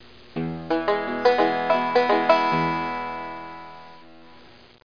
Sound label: banjo; Broderbund Print Shop Multimedia Organizer Deluxe Edition